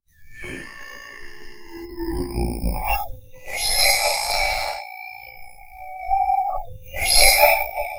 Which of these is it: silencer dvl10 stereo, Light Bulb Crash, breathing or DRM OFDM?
breathing